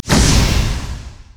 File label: twin bite human; LoL; shyvana